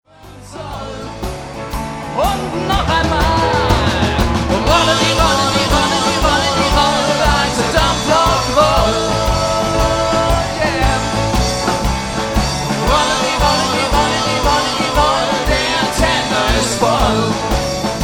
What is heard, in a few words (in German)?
Dampflok Blues